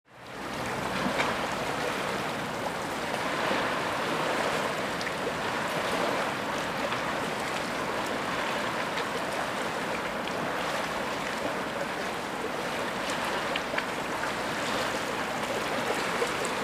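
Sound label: lake sound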